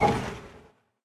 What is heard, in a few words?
Trolley Loop end; Level3